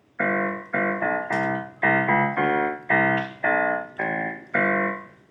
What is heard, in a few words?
G GBC CDbD C B A G, simple piano recordings